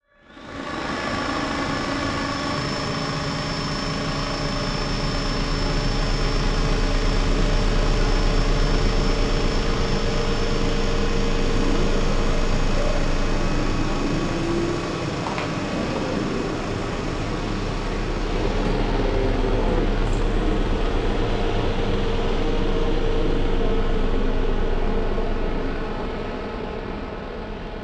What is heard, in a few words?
Distortion Static; AdobeCC; Imaging Elements; Module Production Element Title Imaging